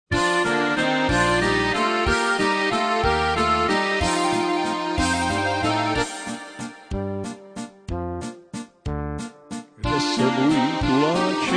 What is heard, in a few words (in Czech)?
valcik